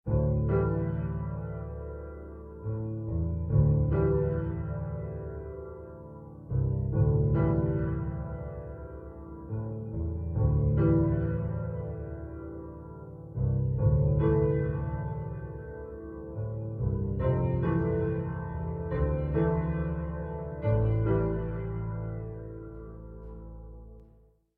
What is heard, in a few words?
company building music